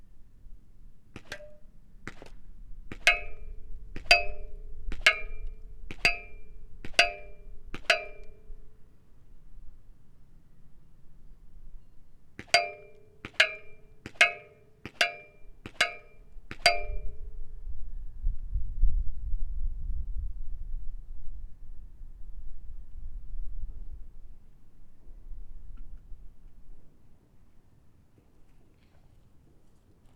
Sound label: splats metal wheel long barrel